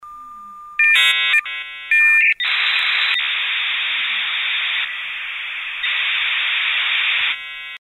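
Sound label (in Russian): модем шипит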